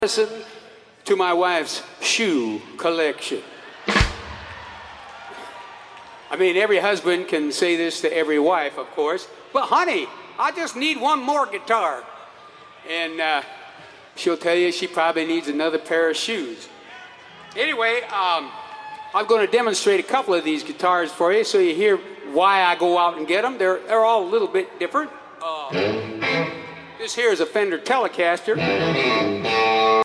Guitar Talk

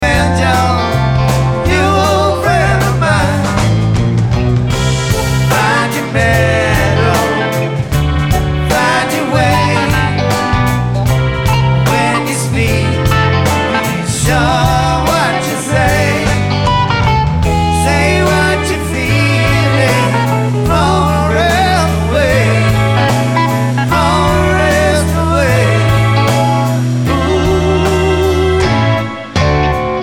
Banjo

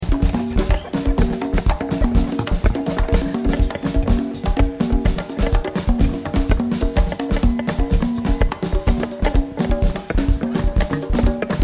Drums

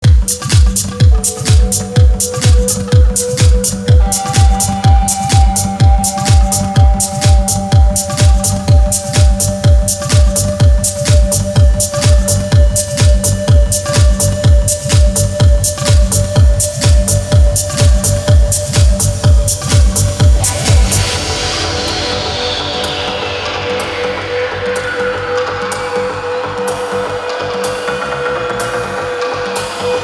House Jam

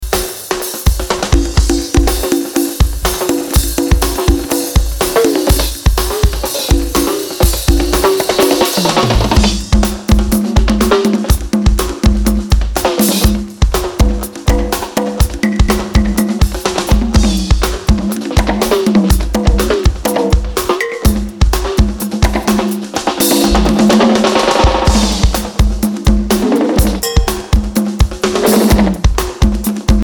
Drum Dub

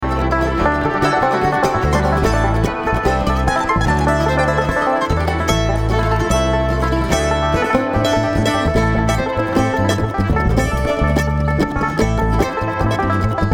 Traffic Jam

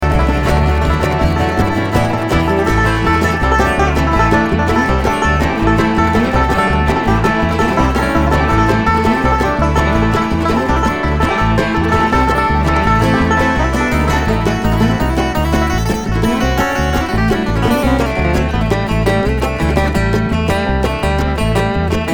Traffic Jam

